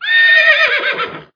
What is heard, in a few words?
Animals Horse